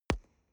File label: explosion bass; hits-explosionen